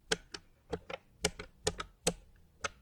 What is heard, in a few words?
button Presses; Apotheorasis